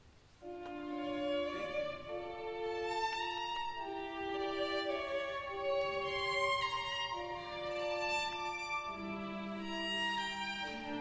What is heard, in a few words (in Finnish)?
Poikakuoro Lacrymosa; Vetelin Poikakuoro